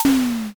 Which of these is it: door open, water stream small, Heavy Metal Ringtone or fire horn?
door open